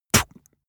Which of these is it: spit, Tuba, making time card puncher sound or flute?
spit